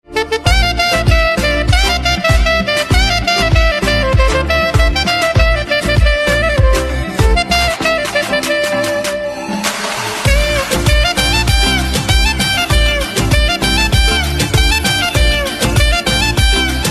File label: Saxophone Instrumental Ringtone